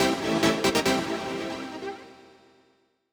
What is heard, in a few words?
TECHNO SYNTH 140; Keys